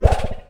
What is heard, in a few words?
wire extend